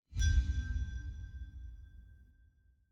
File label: Energy Crystal Ding; Desert SFX